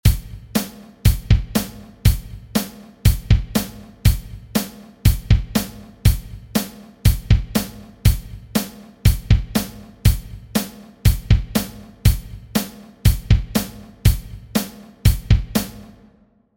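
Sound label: shuffle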